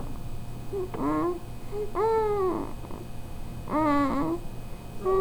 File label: snore